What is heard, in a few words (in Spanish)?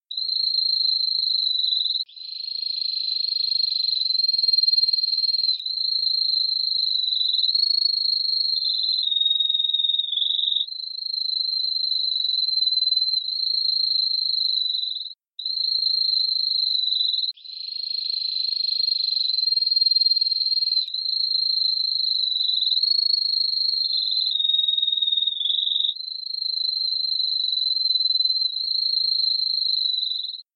Timbre Metalico; θετικές νότες